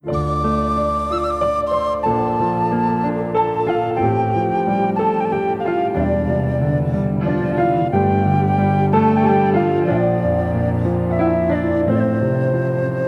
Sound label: Instrumental